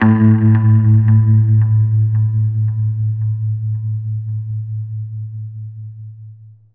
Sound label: Balloon Floating